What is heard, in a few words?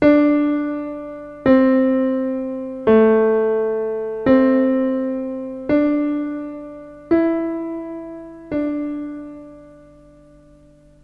piano